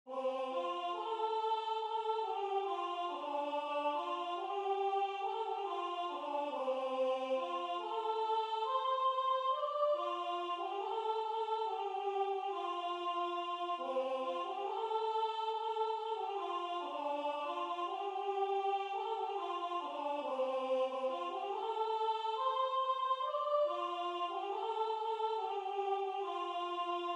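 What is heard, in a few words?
Soprano, Religious Folk Songs Of the Negro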